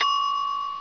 got letter; Hang2000 v1.1

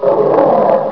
Roar; HyperCard Class

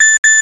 Beep

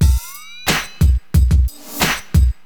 Drum loop, HipHop; LiveAct Pool 1

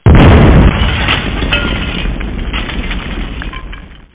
GLASS; t2029

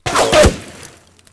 w hit; Team Alligator

